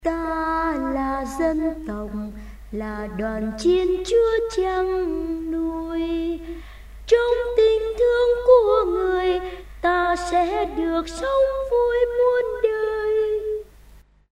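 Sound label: soprano alto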